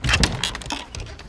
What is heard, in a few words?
wood op; Mafie 1